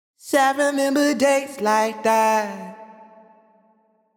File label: Sung Phrase Wet Remember Days 140 BPM F# Min; Cymatics; Euphoria Vocal Sample Pack